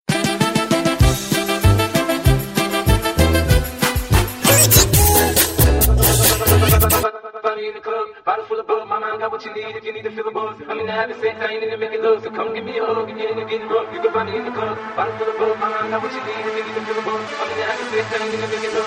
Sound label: funny remix; ringtones